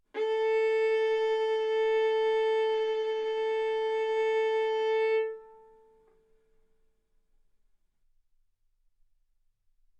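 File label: Violin arco mf A string A4 B4 stereo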